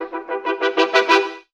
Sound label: horn fading in